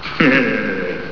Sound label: laugh